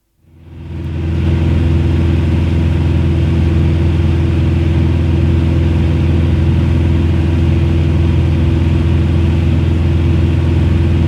Beagle Airdale, interior recordings Constant flight and descent; BBC-Historical